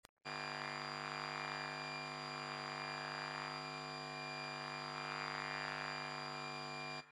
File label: LED switching supply